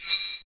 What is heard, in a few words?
PER PROCU, Procussion